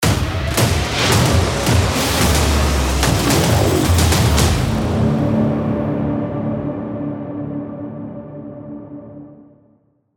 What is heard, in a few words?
Trailer Hit BPM110